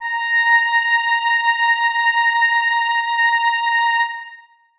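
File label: oboes sustain a#5; Sonatina Symphonic Orchestra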